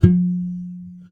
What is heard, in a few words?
Classical Guitar Extended Technique